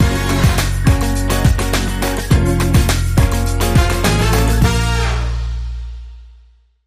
Bumper

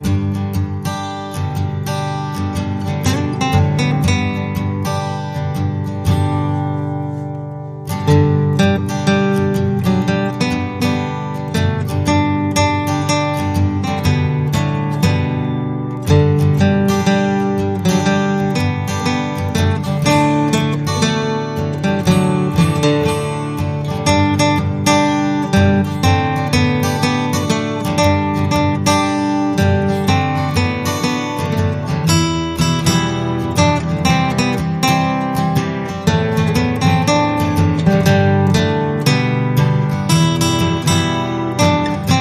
Hark the Herald Angels Sing Simple; Trad (PD)

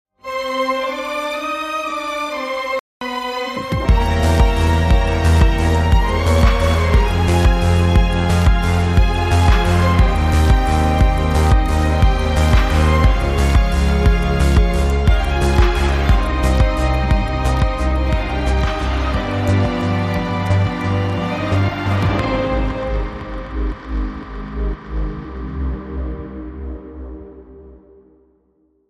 Adagio In G Minor Albinoni Remix

